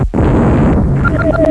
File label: Quark Explode; Infotron